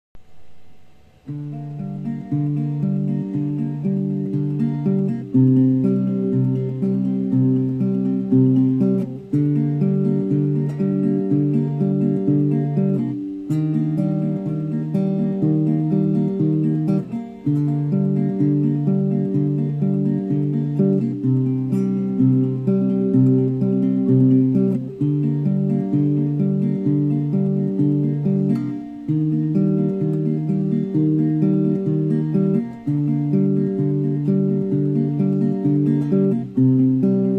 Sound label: Pink Guitar